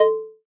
melbourne bounce Bell; Hip Hop; STYLE KITS; D3ron Melbourne Bounce Kit

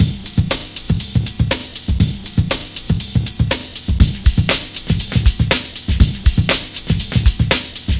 120 bpm unstoppable two part midcut roller

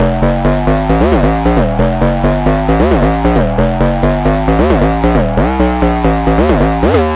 twisty synth bass climb up n down; BASS LOOPS

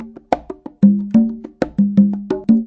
090 bongos, 090 bpm; Bazillions of Bongos

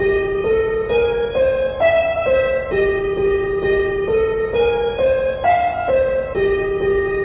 132 dual dissonant synth; melodic loops

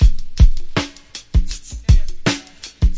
080 bpm groove, drum loops and breaks; too much pot

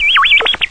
computer

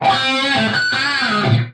straight up guitar lick

